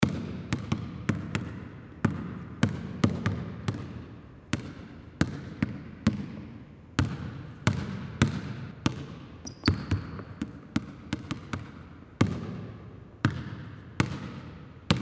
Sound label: basketball ambient game